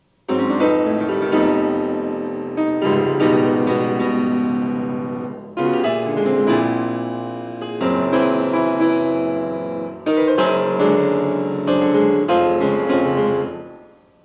piano; Prelude for piano in F major, Op. 49/2

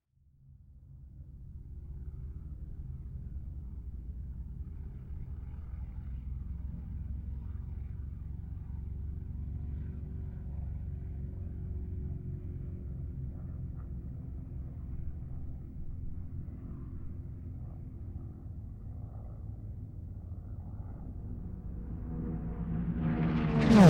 WWII Aircraft; Sound Effects; Reno Air Races; Surround Spectacular

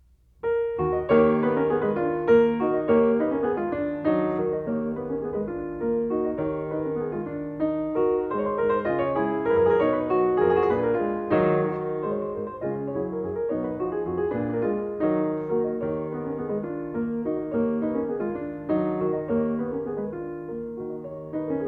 Mazurkas for piano, E flat minor; Chopin

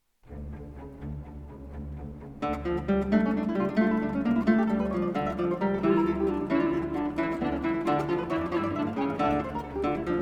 for 2 guitars & orchestra; Girardilla

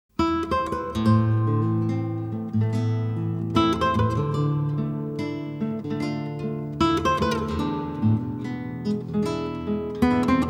Guitarra Version; Masterpiece of Folklore Music

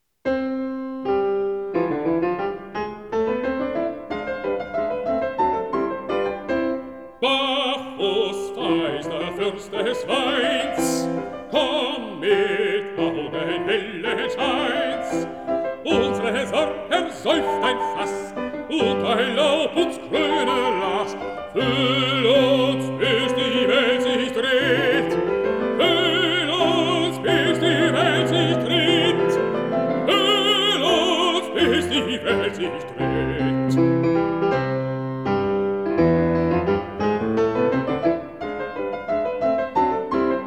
song for voice & piano

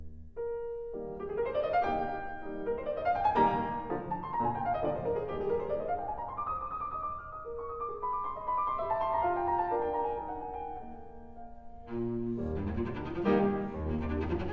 Variations for cello & piano in E flat major

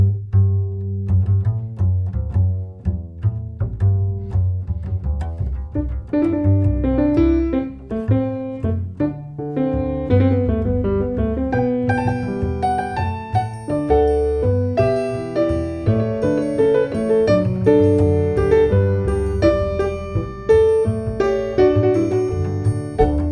Variations, for keyboard

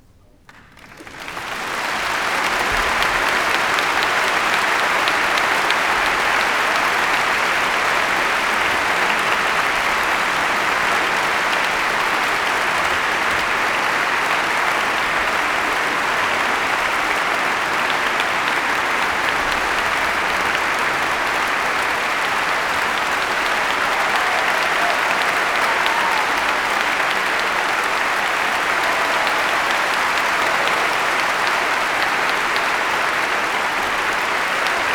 Applause; Joseph Haydn; Symphony in G major, Hob.1-92 'Oxford'; Sergiu Celibidache

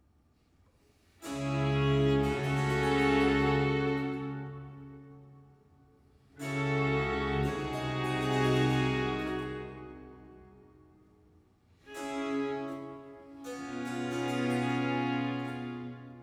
Concerto Grosso in F major; Corelli

